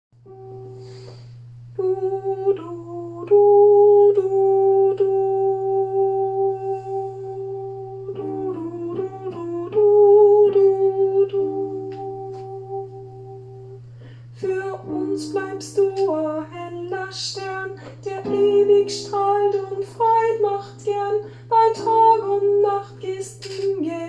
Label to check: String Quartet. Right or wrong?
Wrong